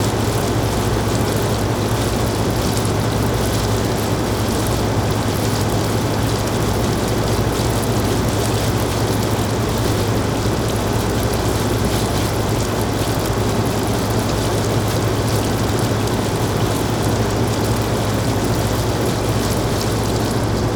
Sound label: FAN Watery Messy Bubbling HVAC Noise LOOP; Articulated Sounds; 100 Fans, HVAC, & Mechanical Drones